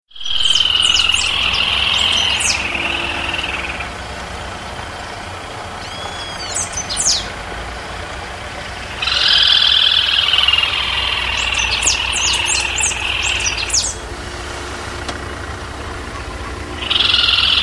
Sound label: Laterallus melanophaius; Uruguay